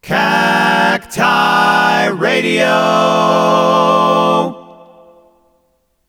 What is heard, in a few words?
Barber Quartet; Cacti Radio; Phone Losers of America Media Pack 2017